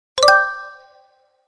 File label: win; yule